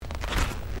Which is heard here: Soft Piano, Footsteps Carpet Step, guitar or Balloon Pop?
Footsteps Carpet Step